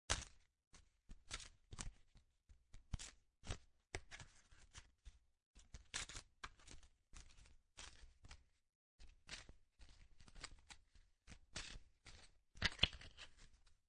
Cards Playing Cards Shuffling; Playing cards, poker, shuffle; Lynne Music

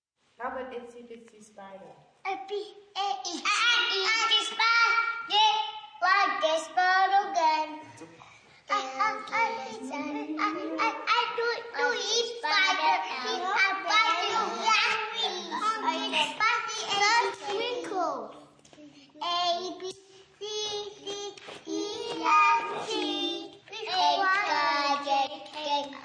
Group, Singing, Group, 5-8 young children; 'Itsy Bitsy Spider'; ABC; one boy stands out; very cute; some yelling and jumbled words; kids; males; VoiceBox

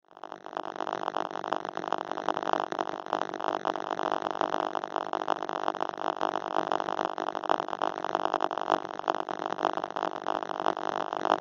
Science Fiction Electric Arcs Constant; Electrical; Sound Design

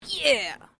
FEMALE EXCLAIM; Female vocal sounds; Exclamations; Sound Effects:Voice; Foundation3

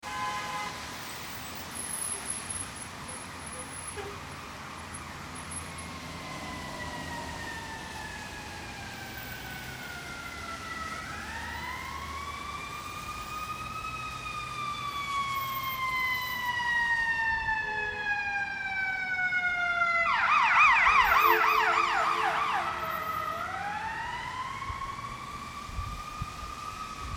siren long in and by city rain; Emergency Vehicle Passes On City Street , Wet Pavement , Long In And By

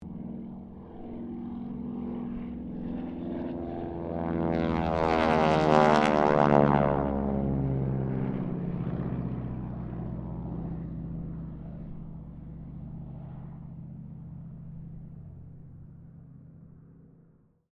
Harvard squadron 4 pass, stereo; Mixed aircraft squadrons; Airplanes; Vehicles

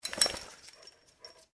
animals dog shake, Dog Shake Body; Dog Shaking Water From Body; McKinney Sound

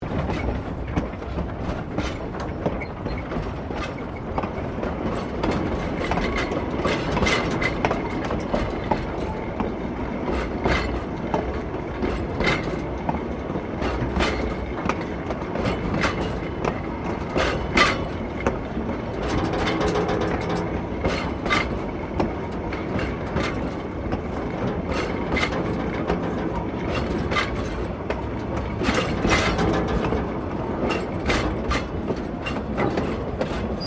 Steamtrain Trucks Rolling Loop; Bellarine Tourist railway vehicles; Trains

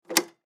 Pay Phone Pick Up Telephone; Technology; Electronics

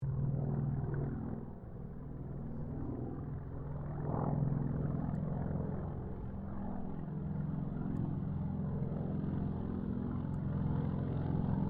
AIRCRAFT CAC WIRRAWAY PASS; CA-16 Wirraway; Airplane; Airplanes; Vehicles

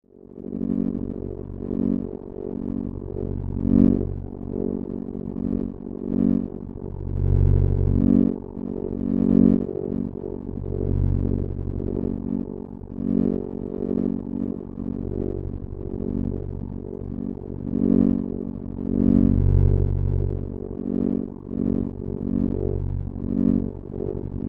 Science Fiction Power Drone Electrical; Drones; Sound Design

